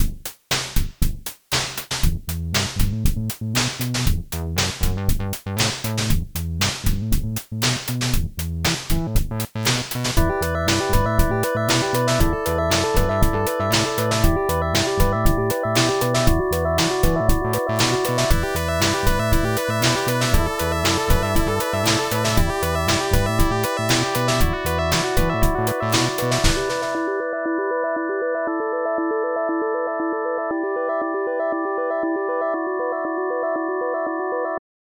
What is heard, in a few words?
poly blep, mono synth; reaper